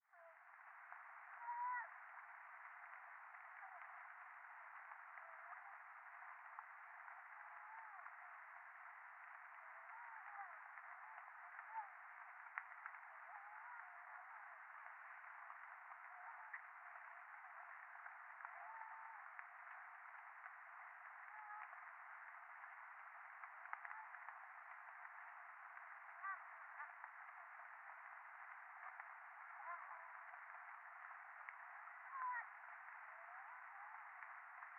電話 イラマチオ 雨

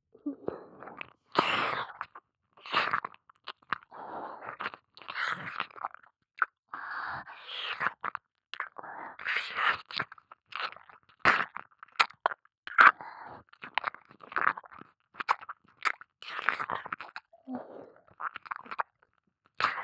耳舐め・ふつう

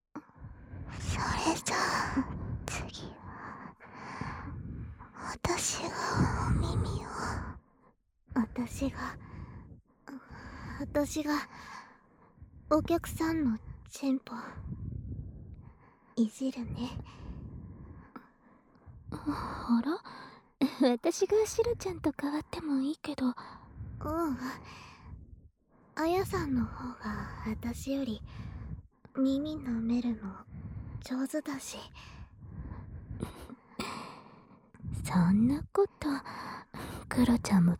反転 両耳舐めとオナホコキマッサージ, ASMR; 舐め比べ無印

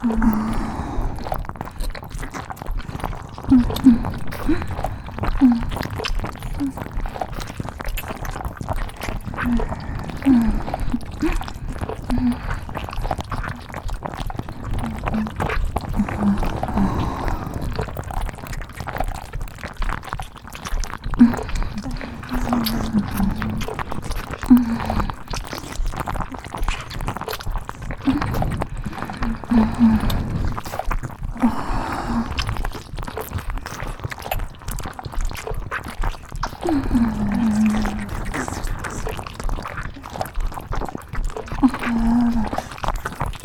キス・両耳舐め・両乳首舐め・触手搾精

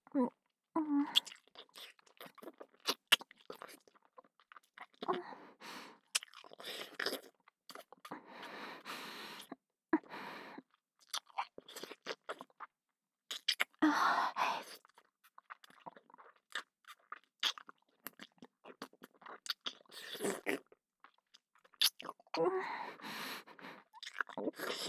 キス

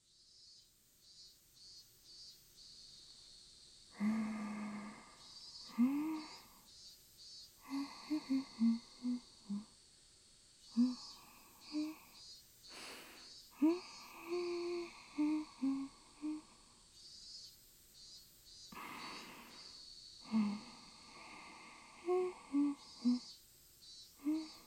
少女の子守唄

